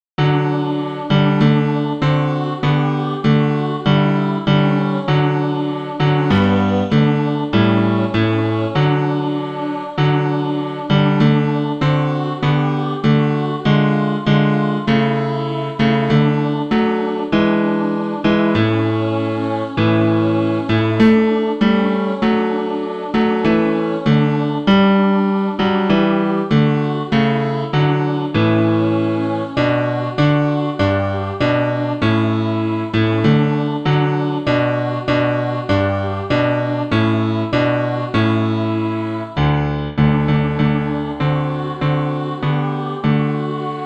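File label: alto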